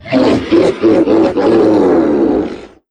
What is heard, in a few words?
Troll Monster Laugh; Legend of Keepers (gamerip) (2019)